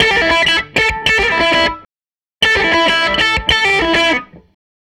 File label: SG Guitar Loop